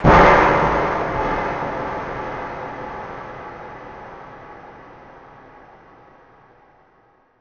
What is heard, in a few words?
big clank